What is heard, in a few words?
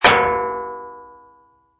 Impact Metal; Metal object such as sword or knife impacts with metal or anvil; Swords; Combat